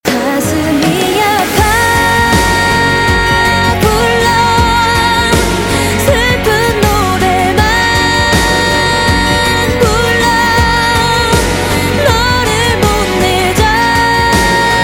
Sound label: Sad Song